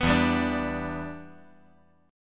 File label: E Minor 7th, down